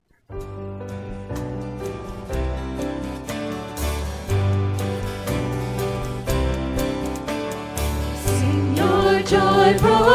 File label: Morning Hymn